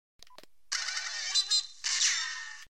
meepmeep

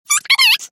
clock

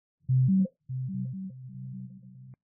steam; vapesquared

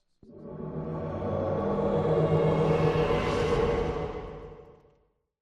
parry; cave

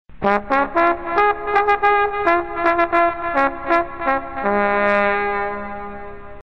trumpet

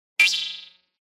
spring

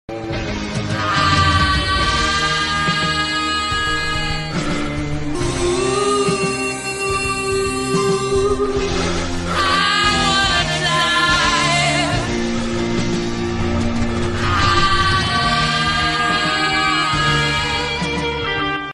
aah

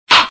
gavel

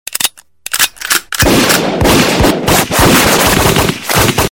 gun

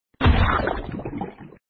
splat

